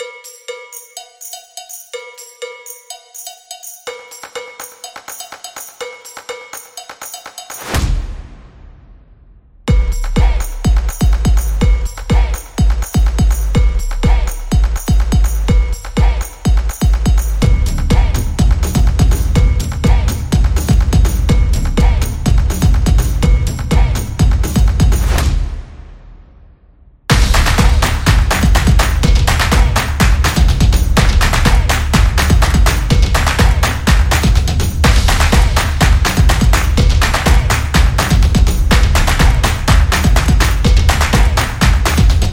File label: Action; sport drums